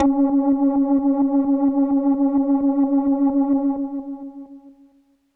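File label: Leslie Kawaii C#3, organ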